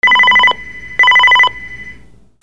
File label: PHONES